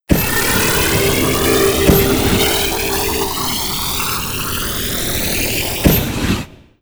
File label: Bomb; FlyForInsanity